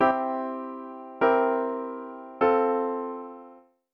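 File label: Secondary dominant exercise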